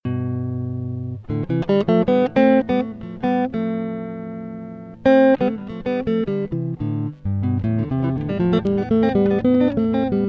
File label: prelude etude